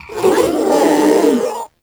Proto Infested Ancient Strike Scream; Warframe; Abilities

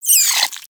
Vocal Pain; Warframe; Hunting Critters; Nexifera

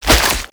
Sticky Ink Tentacle Whip; Shadowgrapher; Mutator

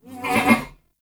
Ram Idle Grunt; Warframe; Duviri

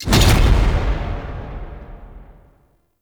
Death Thrax Fist Slam; Ambience; Gameplay; Duviri

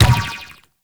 Ball Bounce Rock; Warframe; Tenno Ball; Missions

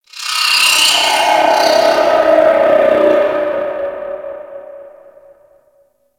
Infested Hive One Off; Warframe; Corpus

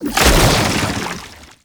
tank infested melee charge; Enemies; Abilities; Lasrian Tank; Warframe

